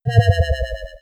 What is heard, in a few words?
square death